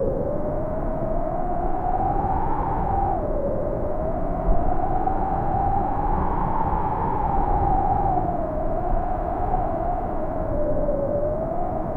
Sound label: moderate gusty wind blowi, mountain